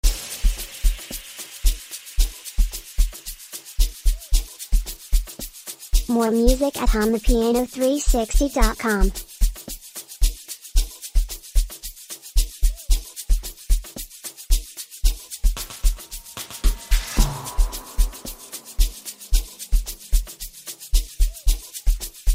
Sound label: amapiano